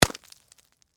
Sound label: Impact Ice Block, Hammer; Wet; Miscellaneous Impact; BlastWave